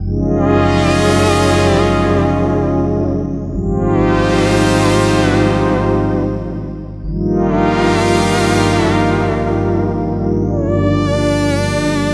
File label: pad Ab maj; antarctica